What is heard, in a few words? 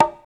Congas, African Contemporary